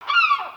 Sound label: Seagull Call; creatures organic